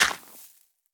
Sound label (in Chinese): 刷子刷沙砾完成声